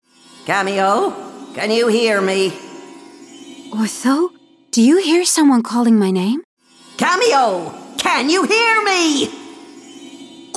Vox; Kameo

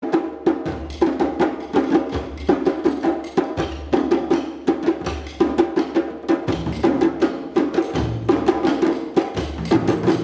Drumming

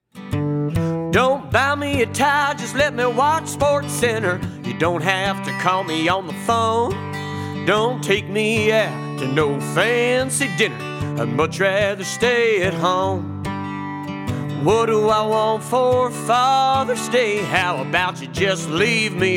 Country song

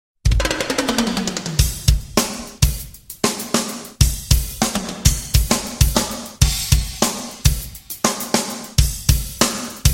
Drum Roll

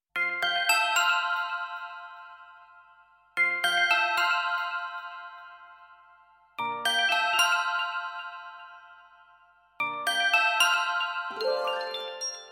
Xylophone

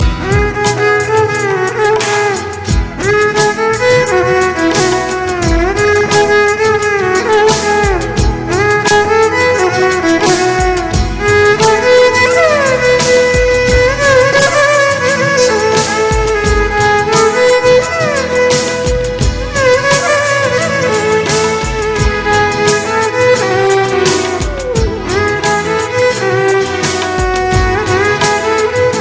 violin

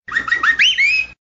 Whistle; Dr Cox

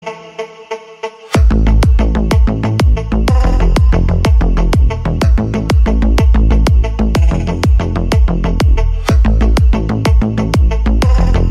Bass

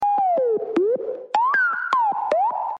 New Sms

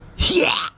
Gut Shot

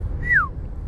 Hey whistle

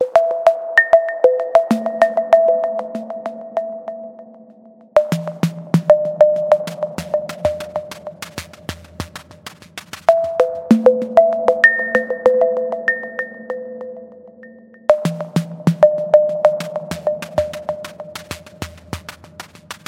Minimal

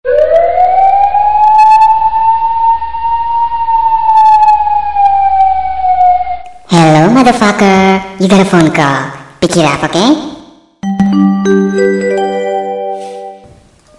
Phone Ringing

